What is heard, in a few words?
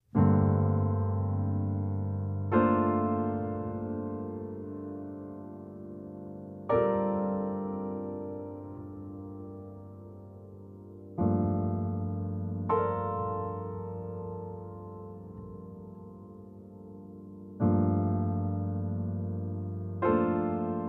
G min7